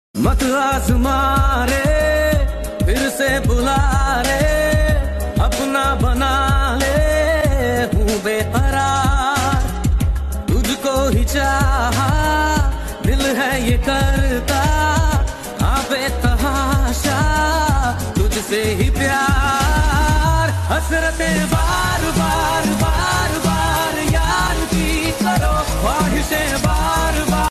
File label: Hindi Song Ringtone